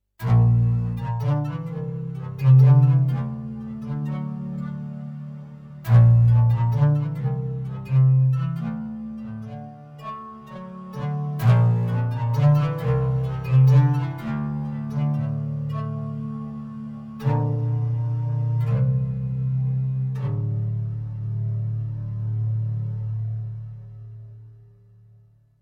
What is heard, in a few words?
Air Cello